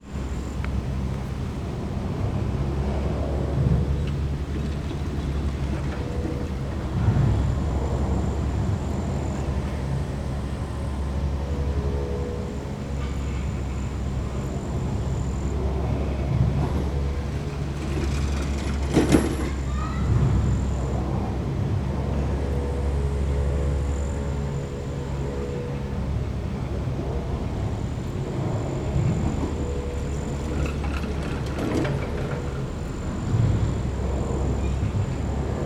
transportation cable car station france luchon superbagneres distance cars pass